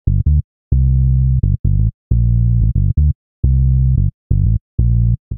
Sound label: Bass G Minor 89 bpm; MIDI Clips; Tonal; Bassline; Beat That; Ableton; Factory Packs; Chop and Swing